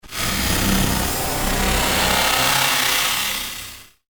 Saw Horror Transition, scary soundscape; Andrew Fleming

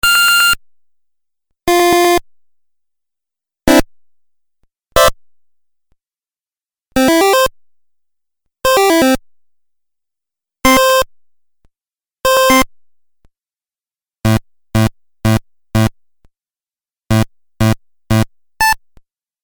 Beeps Video Game Soundpack

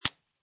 Suppressed Pistol Amphibian Barrel; Pistols; Guns; VRSonic

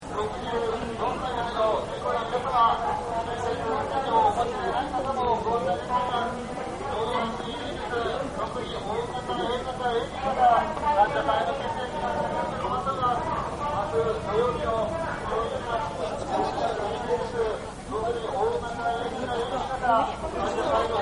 Tokyo Crowded Street; Cities; Backgrounds

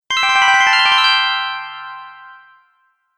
Sound Success, beep sound effects

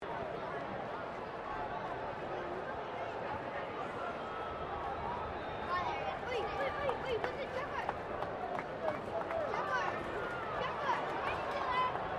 Crowd kids low loop, Kids at Rugby World Cup; Backgrounds; Crowds

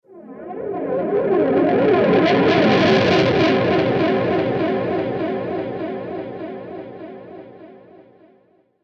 Swell Distortion Whoosh

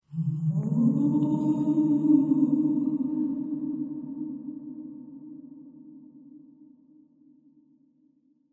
Ghostly Moan; Ghost Sounds; Horror

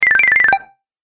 Win Bonus Points, video game sound effects

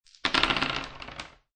Dice Throw Five Dice On Wood Table; Casinos

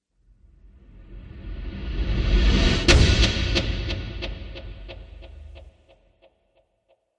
Movie Trailer Whoosh Impact Delay; Trailer Sound Effects

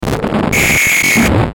Digital Noise Glitch Hiss Blurp; glitches; Blastwave

